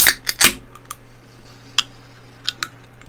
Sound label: soda can open